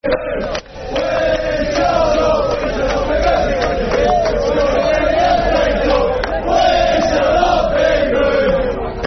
We Shall Not Be Moved, Football Chants (Soccer Songs); Blackburn Rovers Brfc